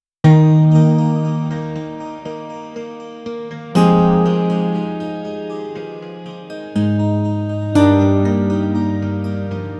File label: ambient melody